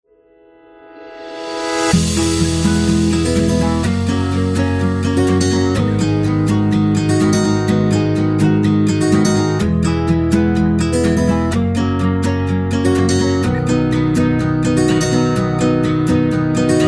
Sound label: Motivation